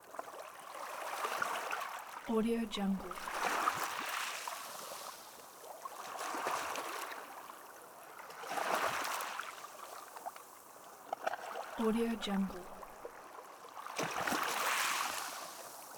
sea waves on gravel beach; stormwaveaudio

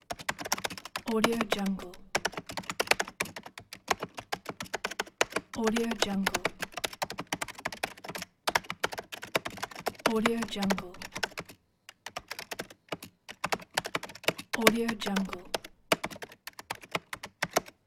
keyboard typing; cook-sfx

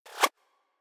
crossbow arrow flyby; future weapons 3; crossbow arrow type 1; soundmorph

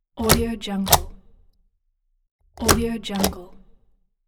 stamp

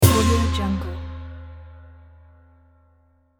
asian drum hit

